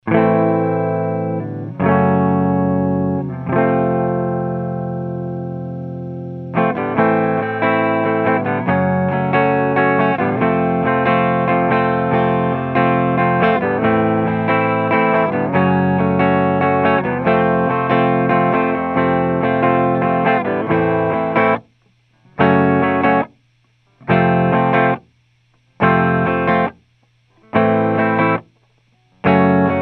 AMP Clean Clarion, clean eguitar; Lauten Audio Clarion FC-357; FET Condenser

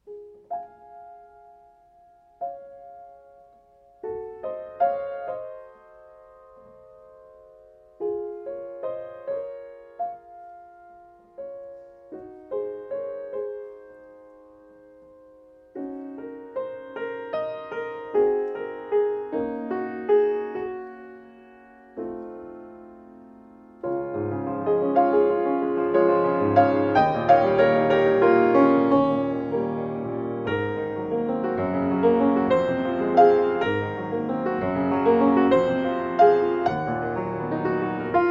Piano Torch

